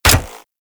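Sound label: Infested Shot, bow; Cernos; Weapons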